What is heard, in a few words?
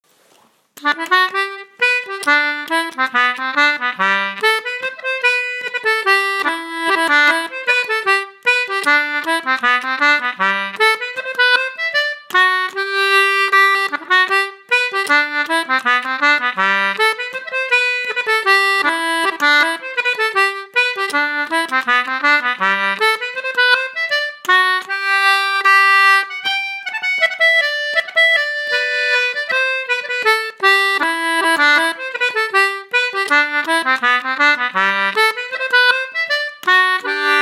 barndance